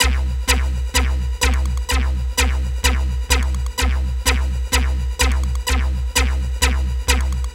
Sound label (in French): synthe acid aigu